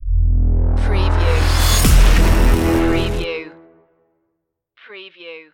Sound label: trailer madness stinger